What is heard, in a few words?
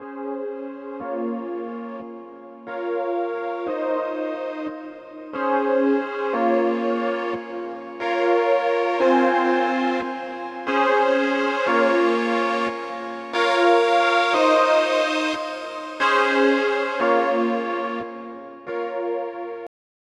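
Chord, 90, A minor, Wet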